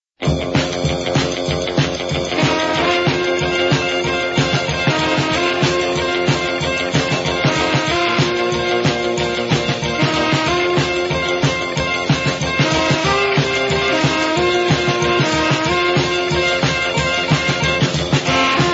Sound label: Strictly instrumental